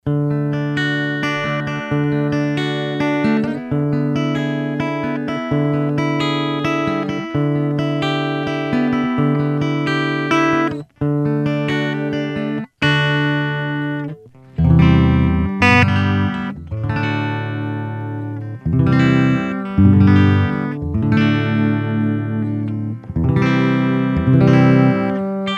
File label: Acoustic, Roland Micro Cube